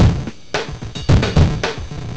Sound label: 3 drums